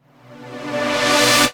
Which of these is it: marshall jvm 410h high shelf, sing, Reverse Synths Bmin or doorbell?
Reverse Synths Bmin